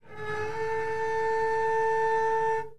cello whale

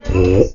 mouthpiece, tuba

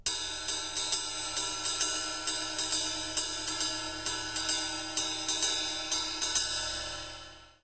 big cymbol jazz beats

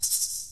rattle, percussion

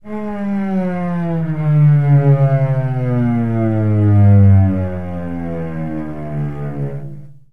slide down, cello

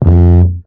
toot, tuba

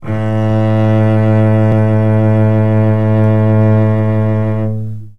low A, cello

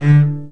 short strum, cello

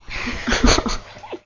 snigger, vocals

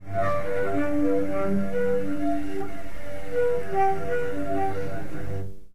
harmonics, cello

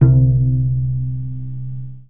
pluck, cello

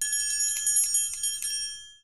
triangle roll

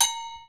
gogo bell low

